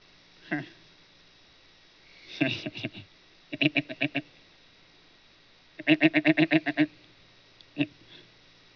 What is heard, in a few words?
laugh; Lachen